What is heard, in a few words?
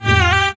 Hit